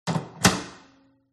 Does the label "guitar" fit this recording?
No